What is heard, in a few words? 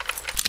holster